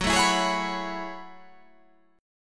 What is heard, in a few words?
F sharp Minor 11th, guitar